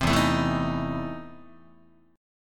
E Major 7th Suspended 4th Sharp 5th, Guitar; EM7sus4#5 strummed

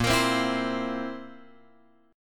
A sharp Suspended 2nd Flat 5th; A#sus2b5 Chord {6 X 8 5 5 8} strummed

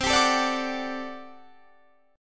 G Major 7th Suspended 2nd Suspended 4th C

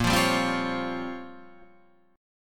A sharp Minor 6th Add 9th A sharp down, chord

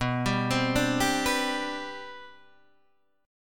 C Major 7th Suspended 2nd B, chord

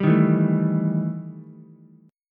E Minor Major 7th Double Flat 5th E Ukulele; strummed; JGuitar

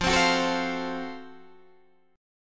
F sharp Minor Major 7th Flat 5th F

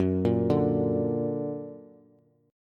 Gb Augmented Bass; x,9,8,7; Gb+ arpeggiated

